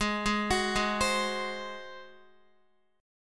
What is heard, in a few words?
Ab 7th Ab Guitar 4F,4A,5C,5G,5C,6E; arpeggiated